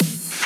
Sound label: FX SN; Multi Drum Loop 07